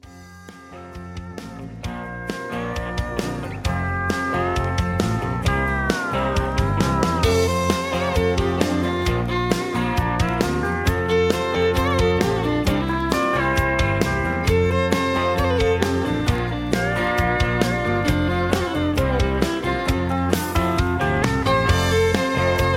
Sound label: Instrumental